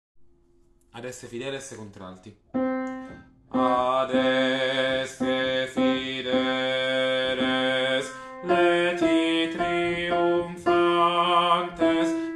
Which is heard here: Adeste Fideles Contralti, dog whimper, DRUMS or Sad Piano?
Adeste Fideles Contralti